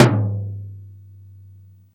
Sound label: big tom drum g key, Royality free tom drum sound tuned to the G note. Loudest frequency: 809Hz; tom drum samples